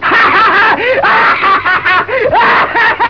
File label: laugh